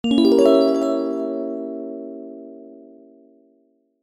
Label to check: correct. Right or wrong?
Right